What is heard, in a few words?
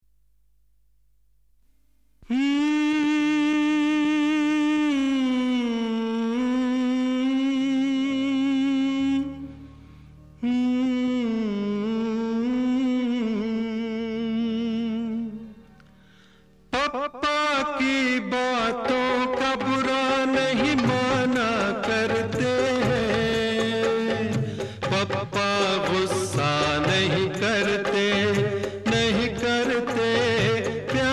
Male; Jaanoo 1985